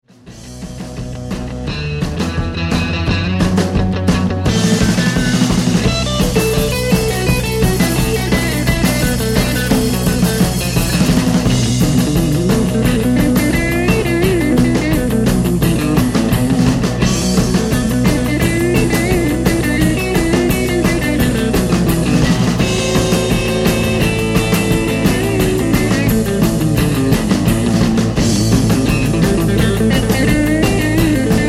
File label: Guitar; Guitar Hero